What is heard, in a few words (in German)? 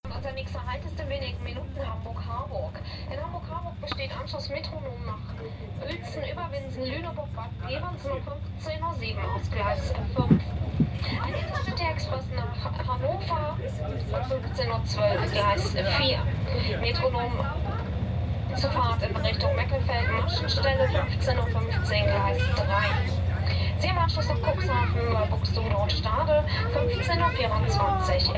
Zug, Sennheiser MD 421; MarantzPMD661; Atmo / Zug